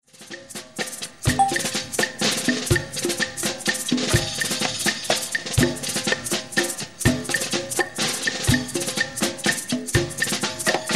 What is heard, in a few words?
Salsa waltz